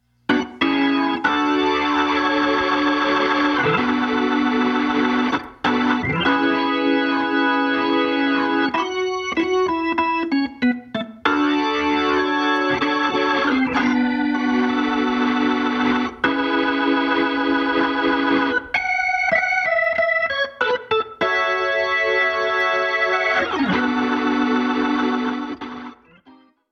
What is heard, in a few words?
stereo organ with chorus